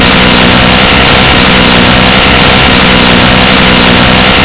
minigun fire loop; weapons

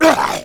fast zombie pain

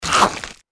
gecko chomp; npc

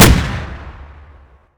firework splode close

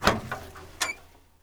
locker door open; doors; bms objects

